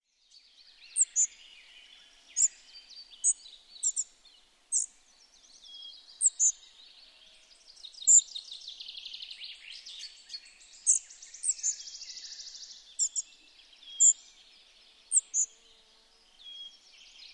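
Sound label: spotted flycatcher; Vaatimaton kuiva sirahdus ”tsri”; harmaasieppo; birdlife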